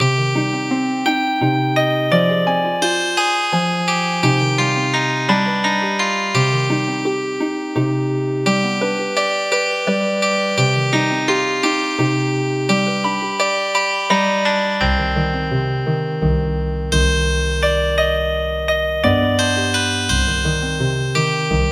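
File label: Healing Harp, traditional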